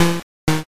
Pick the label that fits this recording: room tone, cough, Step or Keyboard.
cough